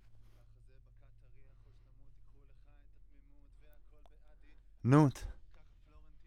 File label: Voc OD